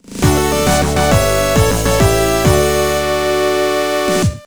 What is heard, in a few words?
Jingle Birthday; wappy dog 2011 nds gamerip